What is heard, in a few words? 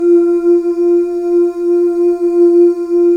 BGV OO; Spectrasonics; Symphony of Voices; BGV OOS 2